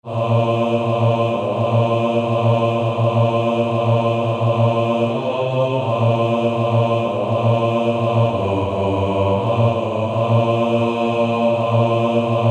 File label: Male Choir B2